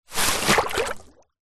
foley plastic bag bin liner empty submerge in deep water bubbles